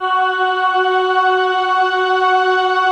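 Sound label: slow orchestra pad, Slow Pad 5th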